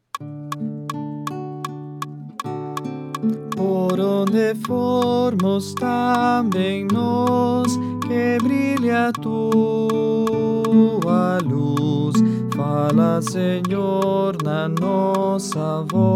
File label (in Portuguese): Soprano